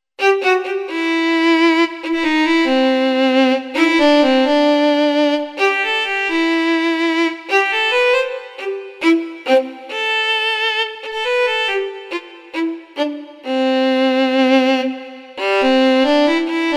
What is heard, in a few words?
Violin